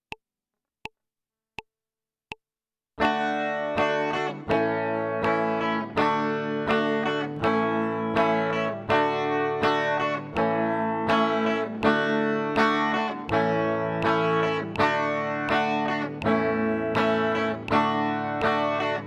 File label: chord change d a, guitar